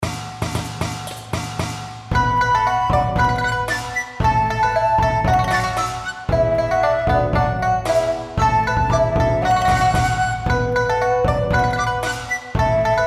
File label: bg happy; paoyao